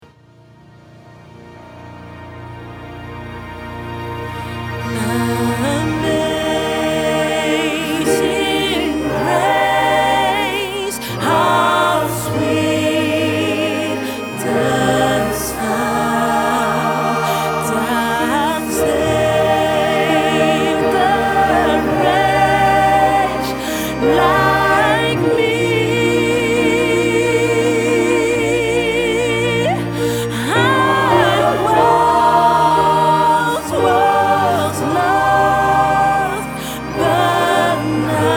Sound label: Amazing grace, Gospel